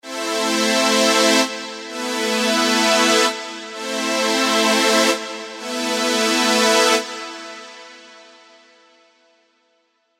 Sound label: Rave Strings; Spire; alonso 18 year anniversary pack